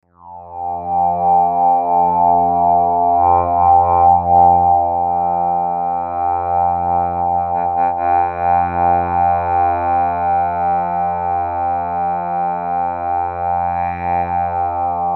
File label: Bed Filter Modulation; Bed Sound Effects; Blastwave; Production Element Imaging Element Accent Transition